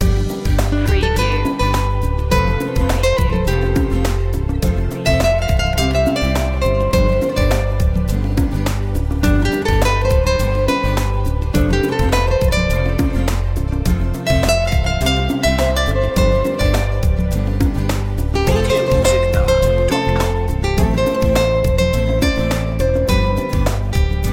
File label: latin ways